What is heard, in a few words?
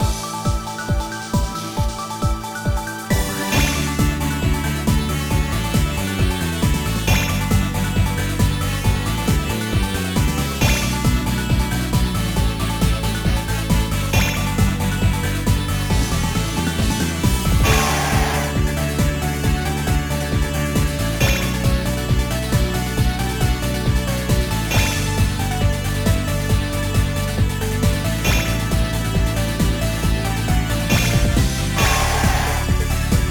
Harp Note Sound Easy; Ryusei no Rockman Denpa Henkan On Air